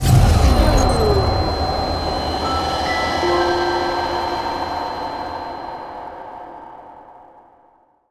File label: fail sound; Osu!